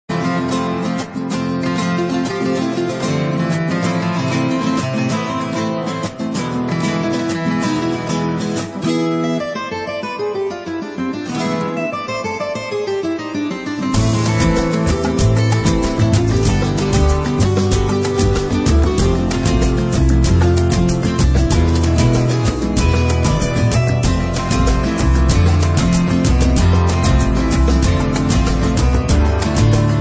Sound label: Flamenco Type